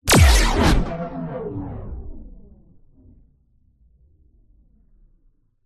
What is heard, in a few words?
science fiction weapon gun shoot